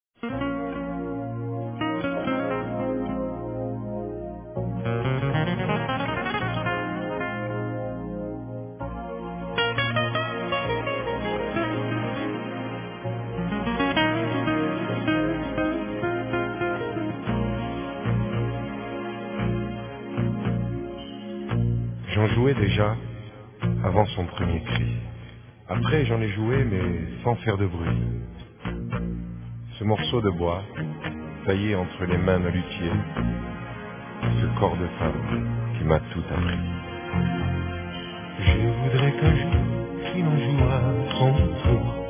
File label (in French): ma guitare a mon fils